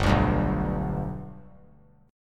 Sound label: G Major down; G strummed; JGuitar